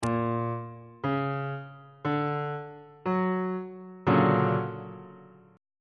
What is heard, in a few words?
c double sharp augmented major 7th 2nd inversion on bass clef